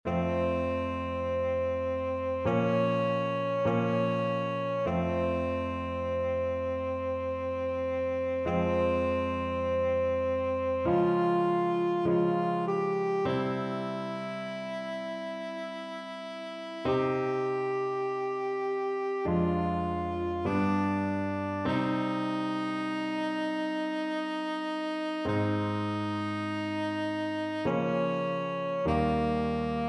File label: tenor saxophone